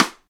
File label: natural acoustic snare sample c key